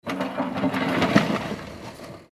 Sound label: empty a barrow